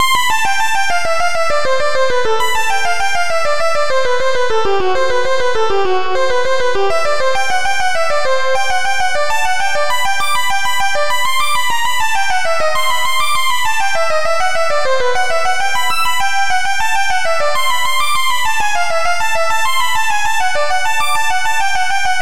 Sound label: Caprice No 1 in C major